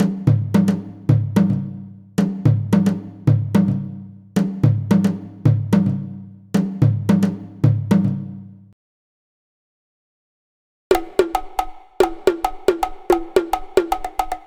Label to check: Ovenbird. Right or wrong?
Wrong